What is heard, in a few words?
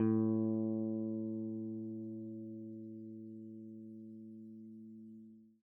a3 open p, swagbass